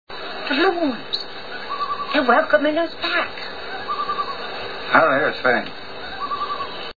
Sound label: loons